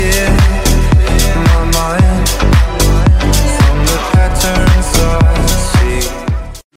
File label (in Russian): russkaya grustnaya pesnya melodiya